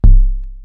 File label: Bass Drum, Cwejman S1 MK2